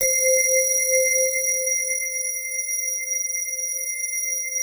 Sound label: digi pad, Pads